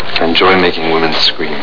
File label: scream